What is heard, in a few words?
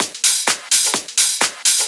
Discohouse Loop 128 BPM